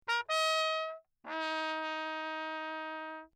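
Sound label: trumpet mute Lechner signal; Perinétventiltrompete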